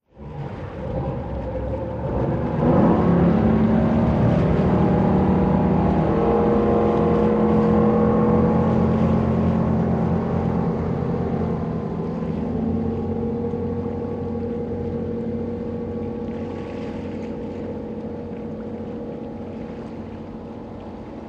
Military Pbr: Accelerate Away. Some Water Movement. Stereo